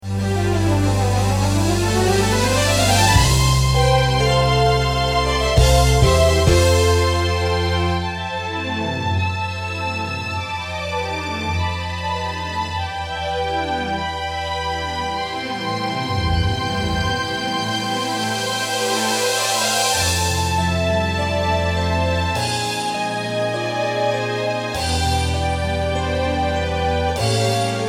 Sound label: strings pad tone